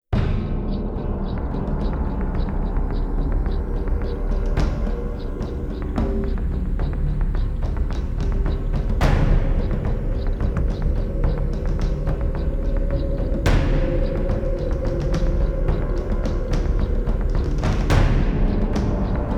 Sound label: (No Choir), Orchestra